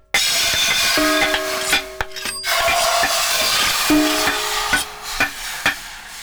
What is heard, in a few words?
Blacksmith